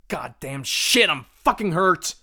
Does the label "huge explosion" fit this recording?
No